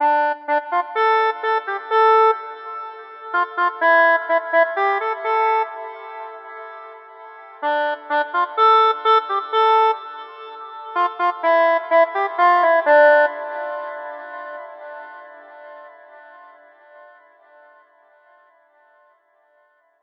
melodica loop; etheral melodica; soundfingers

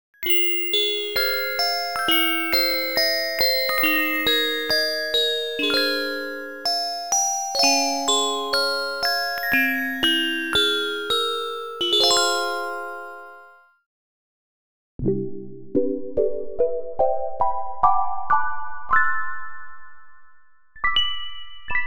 keys

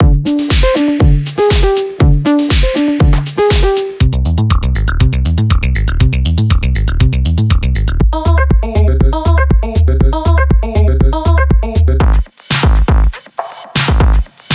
electro; klip by sample magic

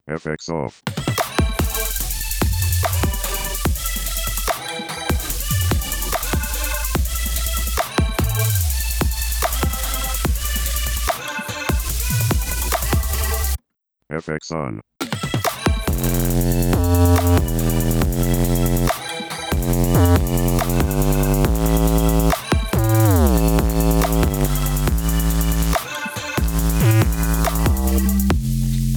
deep bass; flstudio

